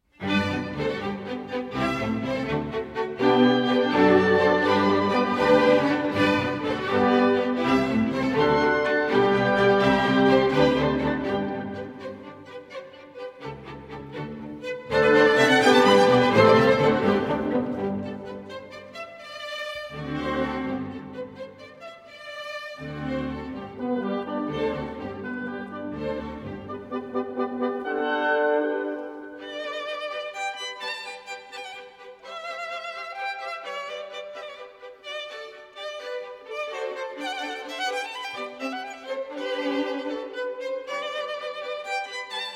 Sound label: Concerto No. 3 in G Major for Violin and Orchestra